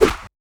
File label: throw pie; Ringling Bros. and Barnum & Bailey; Wii